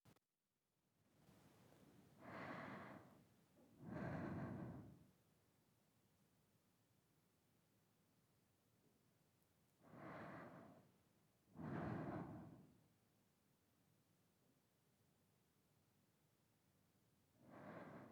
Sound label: Breathing sounds Αργή Slow